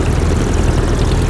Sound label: loop, engine; Quantum Redshift; kaitlyn